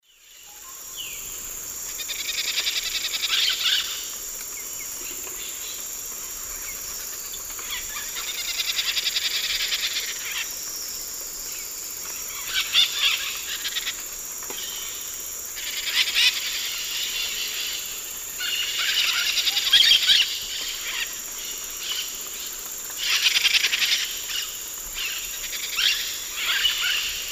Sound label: Aratinga leucophthalma, calls and begging calls group; S. America; Eastern Lowlands